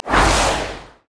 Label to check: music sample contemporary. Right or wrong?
Wrong